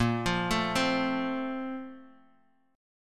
Bb Major 7th Suspended 2nd, Guitar, Drop D; 8,8,7,5,x,x

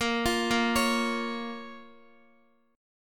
Bb Minor Bb down Guitar; arpeggiated; JGuitar

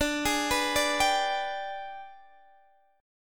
Ab Minor Major 7th Flat 5th chord

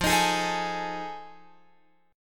F 7th Sharp 9th, Guitar, Open F; F7#9 strummed

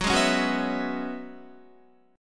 Gb 7th Suspended 2nd Suspended 4th Gb down Guitar; strummed; JGuitar

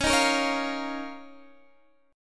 F 7th Suspended 4th Sharp 5th Db

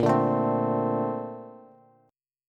A sharp Major 9th, Bass; strummed

